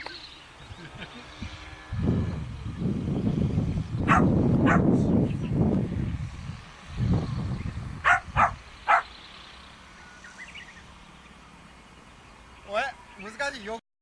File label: landing; himawari2